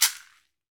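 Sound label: Latin Shakers, caxixi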